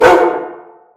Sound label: ghost bark